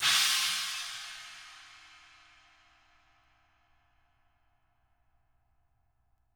CHN, Drums; DW KIT2

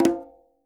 QUINTO; Sampleheads; NYC Percussionworks

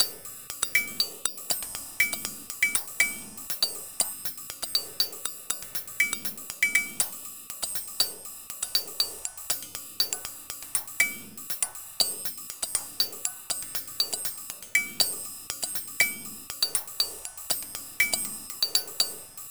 Bellz N Hatz, Percussion Combos, 120; M-Audio; Pro Sessions VOL-38; Abstract World Fusion 2

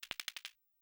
Cym; Sonic-Potions LXR; Rollin

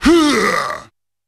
D4 Huuaah!, Yamaha PSR-E323 Sound Effect Kit

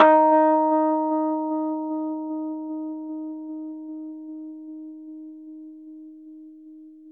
Rhodes D#; 3D Sound; 3D Keys VOL-1

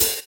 Ride; Equipped Music; Loungin House

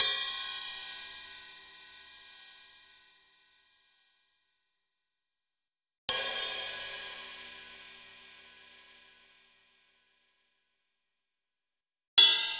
Roland BK-5 Cymbals; Sliced

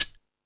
Percussion FX, E-MU Pro-Cussion

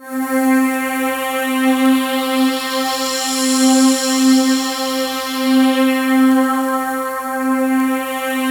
C4, Synth Leads

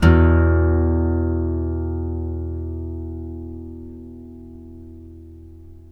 5ths E2, Blues Guitar; ILIO; Fretworks

